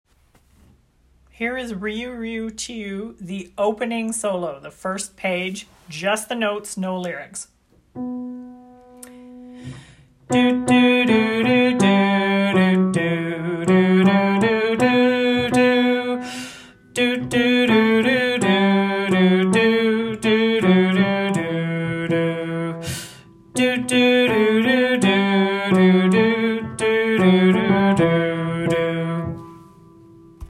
riu riu chiu solo 1st page notes only

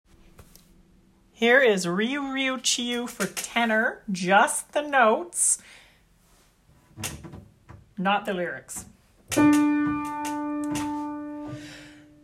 riu riu chiu notes only tenor

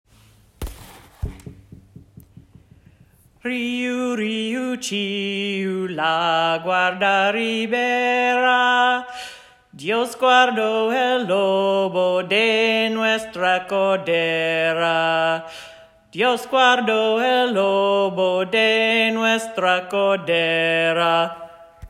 solo; riu riu

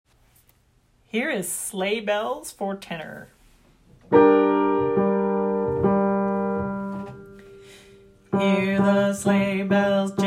sleigh bells tenor

